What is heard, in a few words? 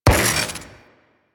Vial Radiation Shatter; Warframe; Alchemist